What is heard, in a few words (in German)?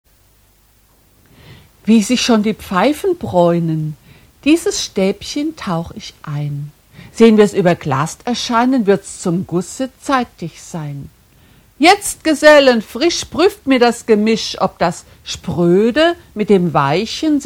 glocke